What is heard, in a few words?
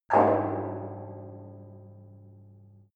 metal impact tank inside